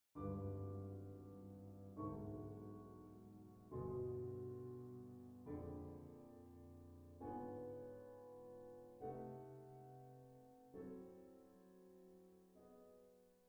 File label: mystery chord synth